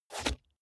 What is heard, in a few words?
car bmw e46 interior sun visor open